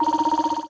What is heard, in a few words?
ui count score